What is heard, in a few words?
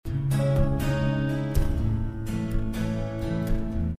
Punchy Dbm,A 62